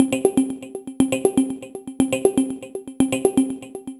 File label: Auto Arpeggio; Dr. Fink's Funk Factory